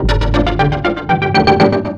ORCHFX, orchestra, Asian Traditions

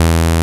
SOURCE SAW

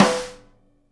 SNARE RIM, Live Snares; E-MU Producer Series Vol. 8 – Platinum Phatt

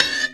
spruce, percussive

